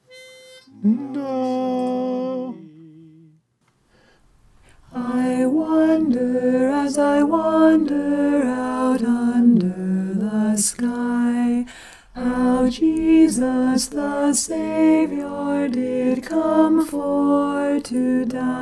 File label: alto